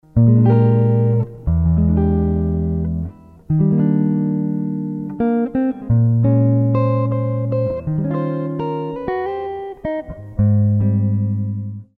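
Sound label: less chords sample, jazz guitar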